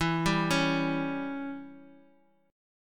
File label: E Minor 6th E; Em6 arpeggiated; JGuitar